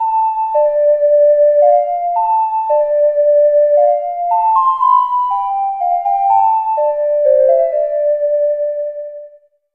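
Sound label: Ocarina Time Normal; legend of zelda the majora s mask 3d 2015 3ds gamerip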